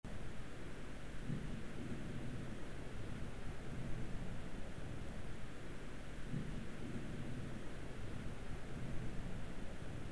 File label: snow